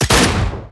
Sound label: Doomsday Machine Attack